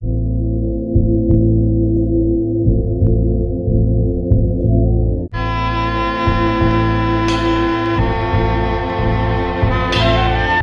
strings, Sad Loops

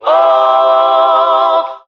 "oh", group of men sung; ooh ahh

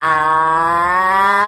"ah" cartoon LONG; Voice; ooh ahh

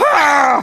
scream

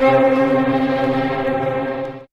tremelo, String

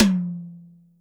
Toms

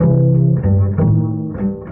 bass bluesy; Loops; Phonky

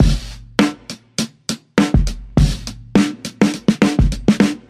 grinding, funky drum loops

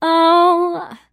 "oh" SUNG

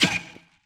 "huh"

